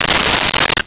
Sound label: Nails Falls on Ground; Tools